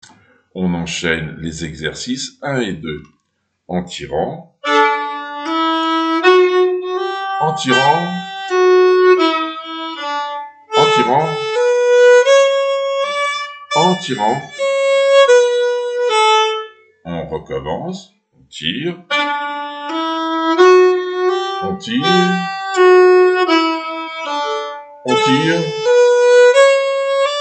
nyckelharpa; Liaisons 1 et 2

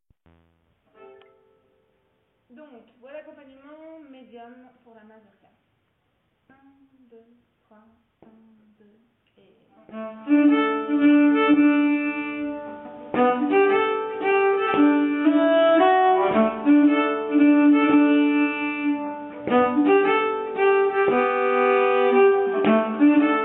Mazurka Medium, nyckelharpa; Mignonne Mazurka Medium

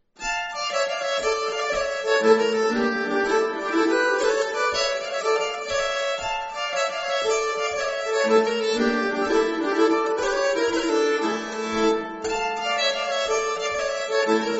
polska, nyckelharpa; kales nils

